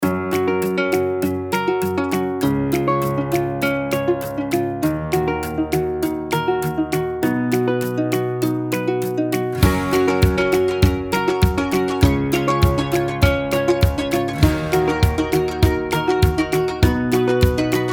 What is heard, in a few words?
funny cartoons happy vlog music